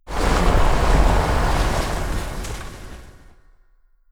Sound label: alpine planet; ambient; Stellaris